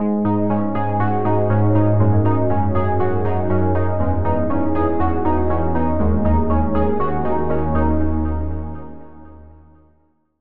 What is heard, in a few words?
Key; Routed Sound; Sylenth1 Expansion - Reality Maker Vol.1